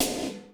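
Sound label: snare drum GATED; Crypton; DrumBase5000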